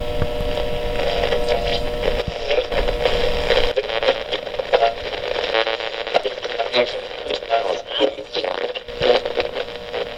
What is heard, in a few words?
Amradio